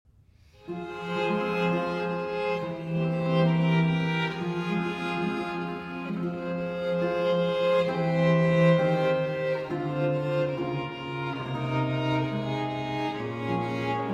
Sonata For Violin and Basso Continuo, A Major, Tremulo Adagio; in A Major La Guerra II.